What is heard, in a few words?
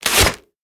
Arrow Loose